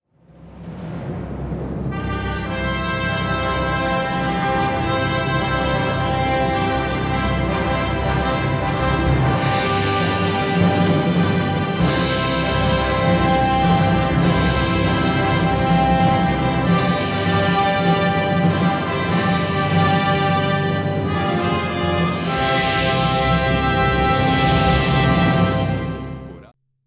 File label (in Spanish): clarinada clarins; 4 clarins